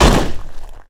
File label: dirt hard, Impact; Cars; XBOX